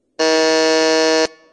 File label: time over